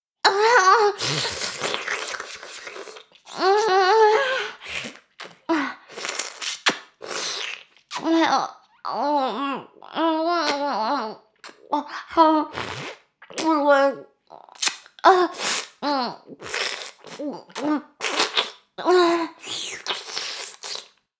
フェラ音声; 少尉; 牧場戦腺

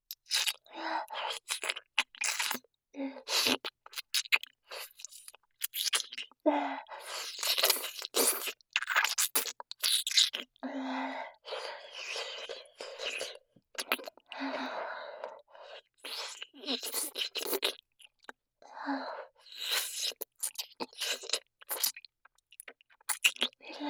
れろれろ乳首を舐める

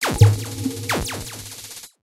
ビリビリ

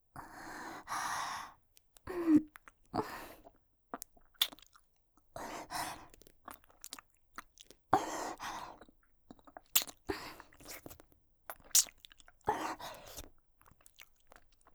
言葉を忘れて ケモノックス 発情フェラ

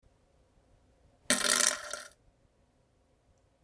ぶぶぶぅ, ふぇち音 下痢の音

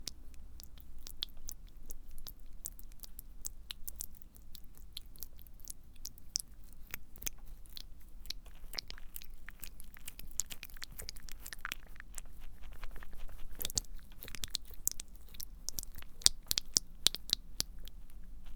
クチュ音, 効果音

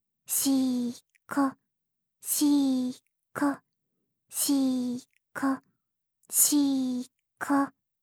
シコシコ オンリー ゆっくり; オナナビ4 ～ゆかりのオナニーナビゲーション～

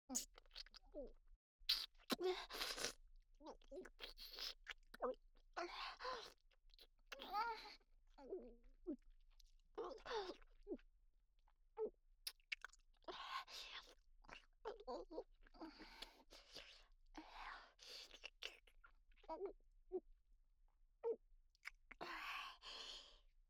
フェラ・精液を飲んでいる声; おとなし少女系カノジョ

